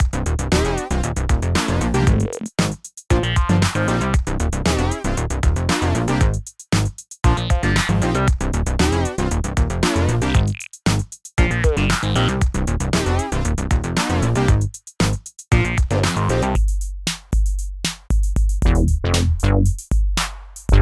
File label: Breakdance Electro; alchemy; himalaya vintage